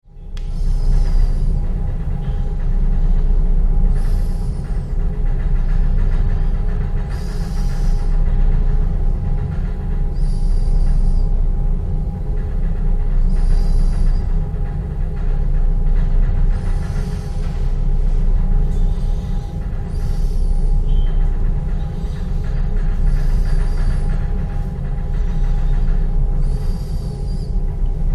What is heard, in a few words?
Ship Large Ferry Car Deck with Vibrations; Tom Hutchings